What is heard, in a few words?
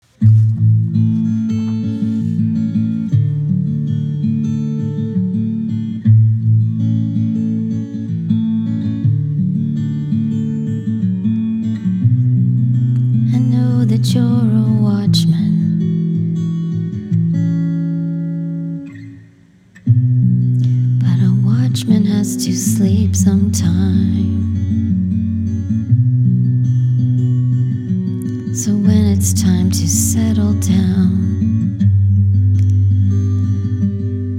guitar